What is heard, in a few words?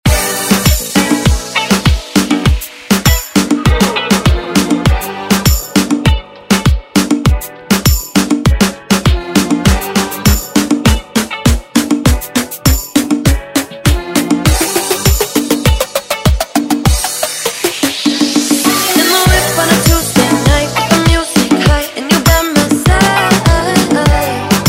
Moombahton Redrum